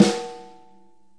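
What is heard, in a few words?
snare, Multisampled Real Kit; REAL LIVE KITS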